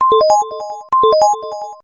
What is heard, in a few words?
cute tone